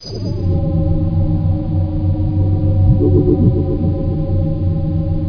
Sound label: scan; Star Trek JR